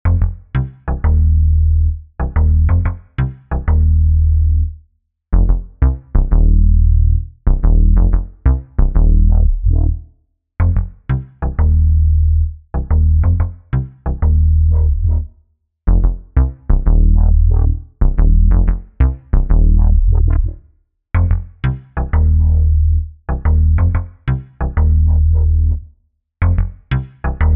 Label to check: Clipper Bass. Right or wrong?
Right